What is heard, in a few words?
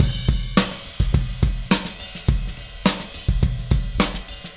Beat Crash; Sonic Foundry (Sony Creative Software); Indie Rock Anthems